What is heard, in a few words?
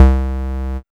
BASS